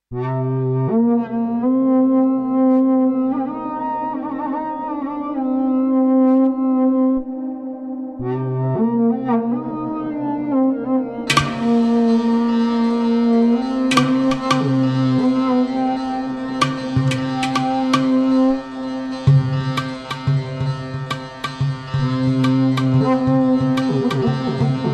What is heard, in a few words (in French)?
AMBIANCE TEMPLE